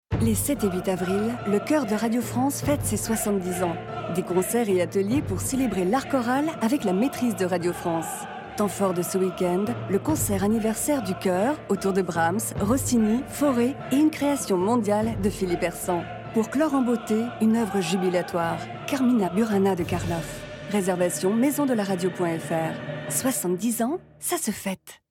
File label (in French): choeur; RF ANNIVERSAIRE; SPOT RADIO